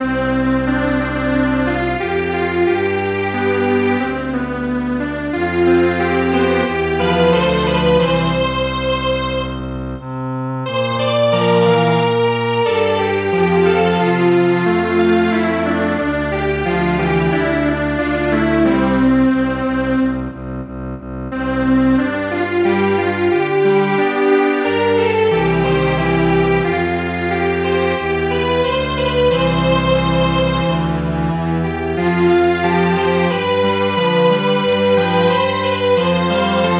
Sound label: Strings Quartet; Vande Mataram